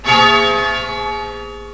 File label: Bell; OldBells